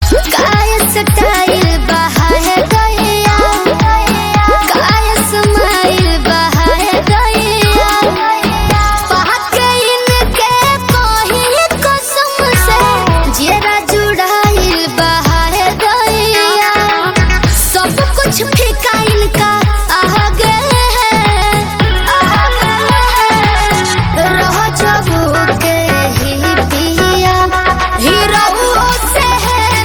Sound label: Ringtone